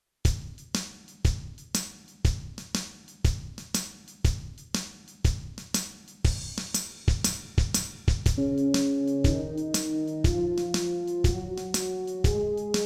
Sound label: Scale Eb, brass practice